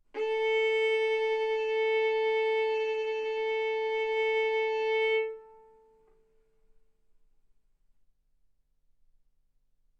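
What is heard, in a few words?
Violin arco mf A string A4 B4 stereo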